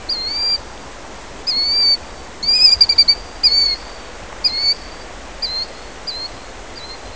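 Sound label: killdeer; song birds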